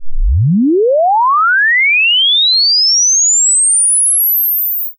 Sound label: sweep pcmconv, snes resample